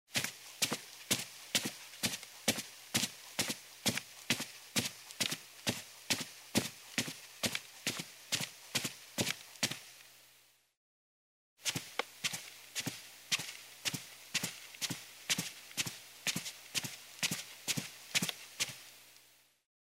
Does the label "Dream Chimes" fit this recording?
No